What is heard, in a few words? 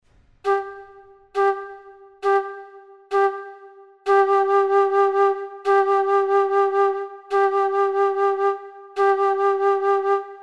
Flute; Strauss Richard; Zueignung